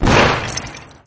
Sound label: crate explode sound; lego star wars